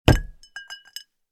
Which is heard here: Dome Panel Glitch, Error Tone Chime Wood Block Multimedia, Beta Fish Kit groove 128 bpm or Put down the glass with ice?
Put down the glass with ice